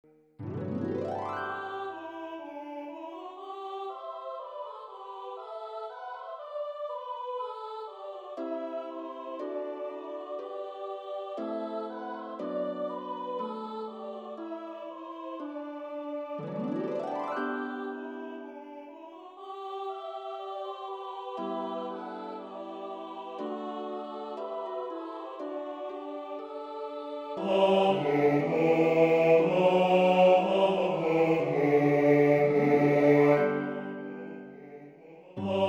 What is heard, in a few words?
Basses